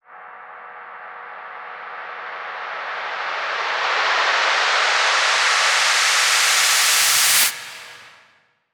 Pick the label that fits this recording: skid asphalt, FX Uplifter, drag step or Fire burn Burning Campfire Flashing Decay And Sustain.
FX Uplifter